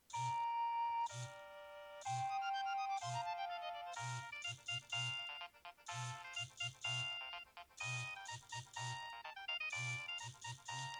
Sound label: nokia 6100 ringtones